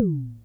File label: SYNCUSSION